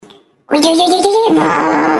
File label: fart